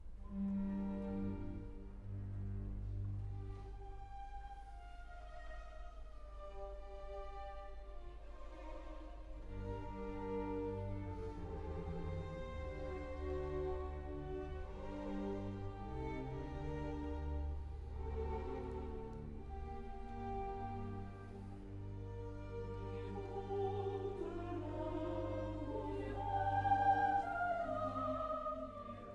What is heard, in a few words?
behold the lamb of god, chorus